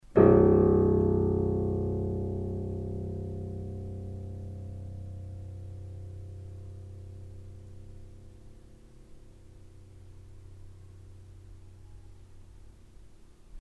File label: Do Gaveau, piano